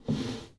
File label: Foosball Blocker Slide